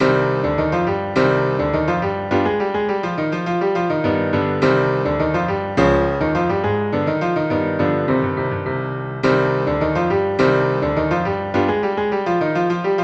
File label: Hurry, synth